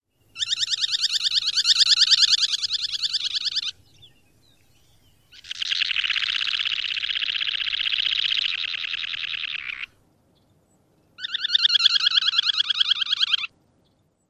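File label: semipalmated sandpiper calls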